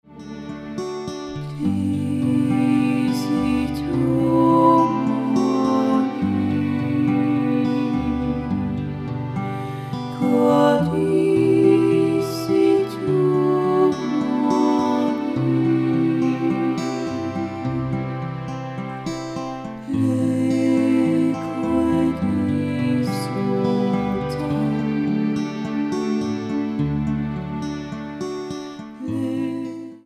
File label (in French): Mantra de la douceur